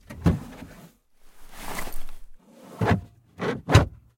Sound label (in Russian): otkritie i zakritie yashika stola